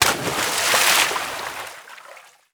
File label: environment water splash